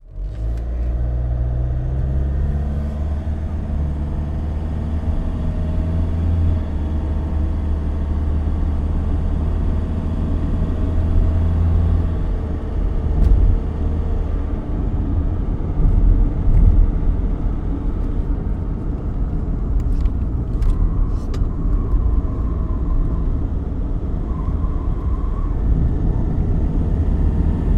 transport car suv away drive corner hard tyres screech accelerate internal